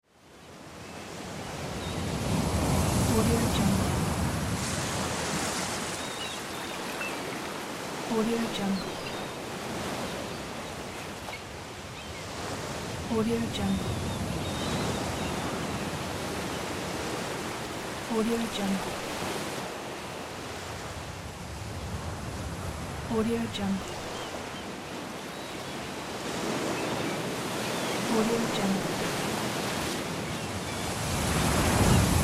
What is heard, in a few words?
beach ambience